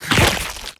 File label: Attack